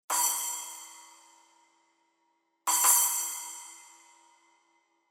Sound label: Splash 9